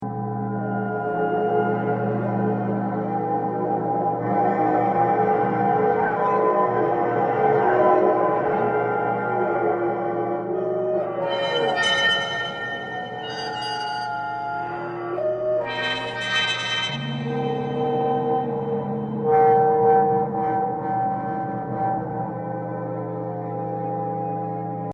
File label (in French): clarinettes